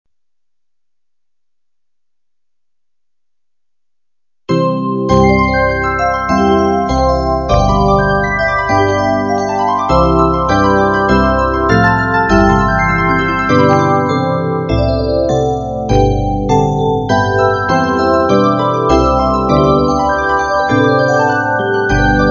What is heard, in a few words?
Adeste Descant